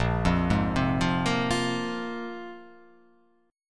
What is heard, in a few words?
Bb 7th Suspended 2nd Bb down, chord; Bb7sus2; arpeggiated; JGuitar